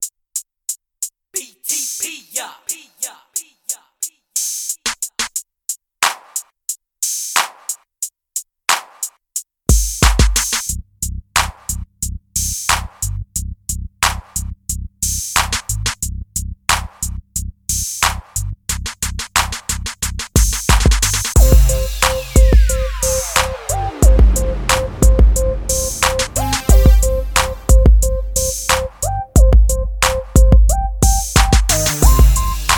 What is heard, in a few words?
(Instrumental)